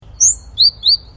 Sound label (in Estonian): Rasvatihane häälitsus; summer